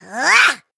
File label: Female Goblin Attack